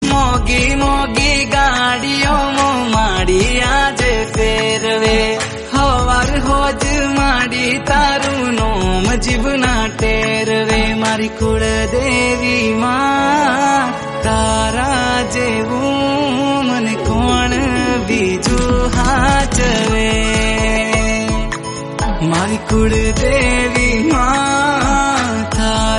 gujarati song

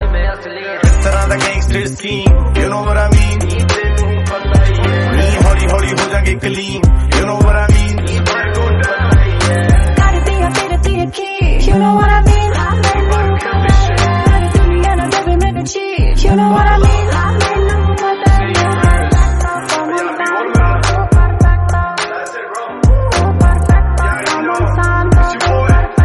bass punjabi

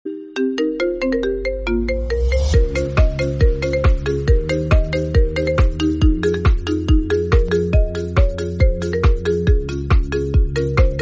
iphone remix

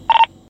motorola starcom

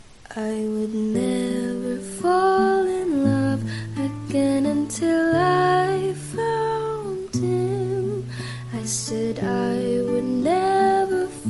mashup song

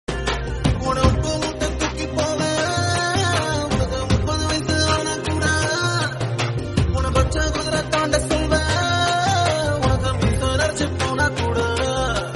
tamil bgm

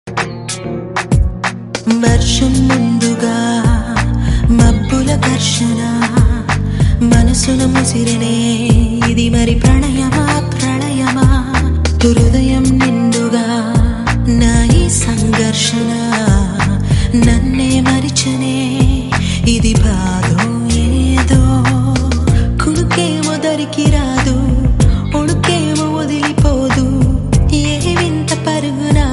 telugu movie song

